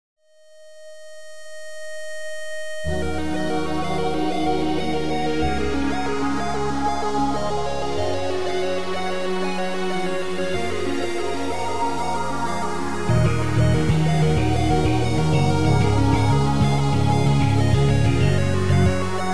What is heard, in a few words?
menu music